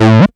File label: Acid Sounds A, Synths; Vengeance Samples